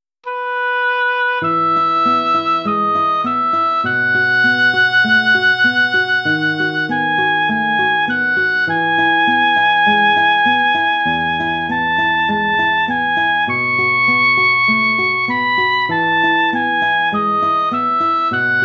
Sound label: etude major; chopin